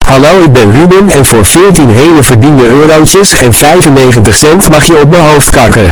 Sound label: bass bass bass